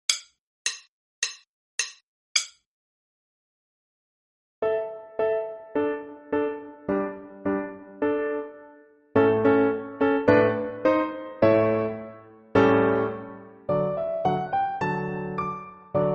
Accompaniment Track

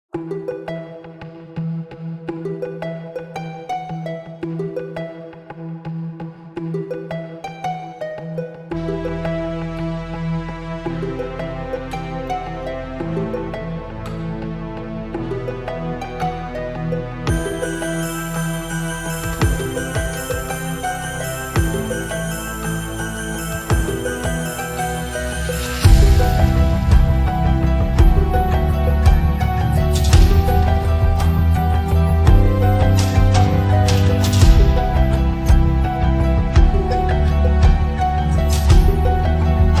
BACKING TRACK

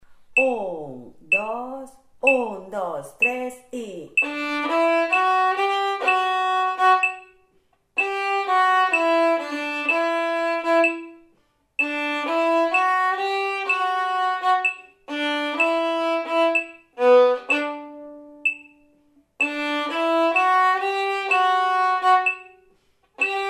Conga violin

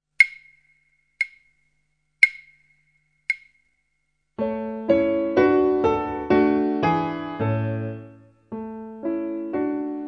piano

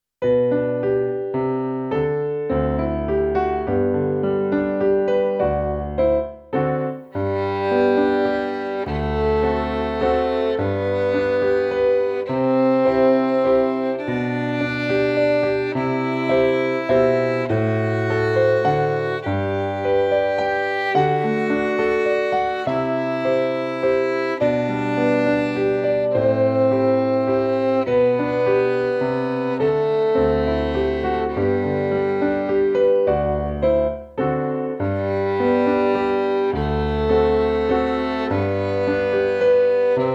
G Major 3/4

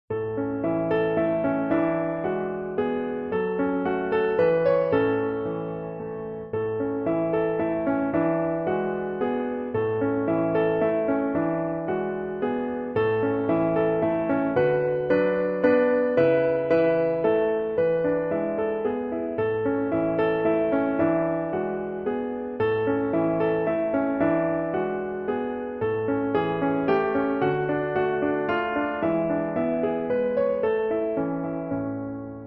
See Saw Accompaniment Track

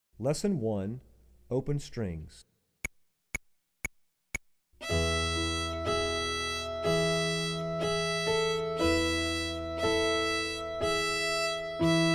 Open Strings

